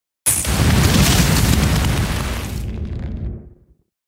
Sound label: Thunder element